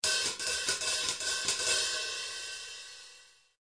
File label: 148 jazzy hats; 141 to 160 bpm